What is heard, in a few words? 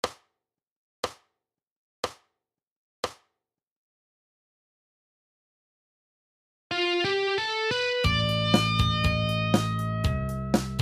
Style Power Blues